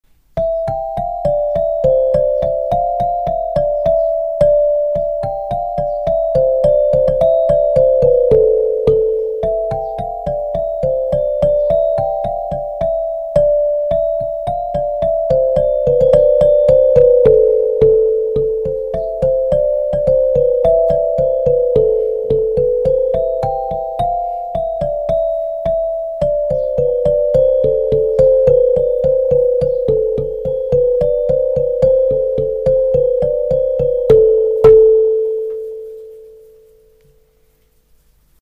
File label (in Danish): alufon